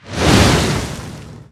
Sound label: Imp Appear